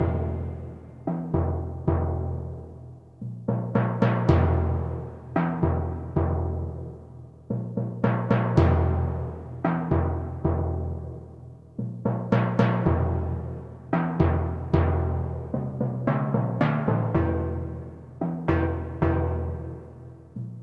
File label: Unused Music; Shivers